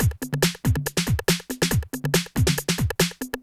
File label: techno 140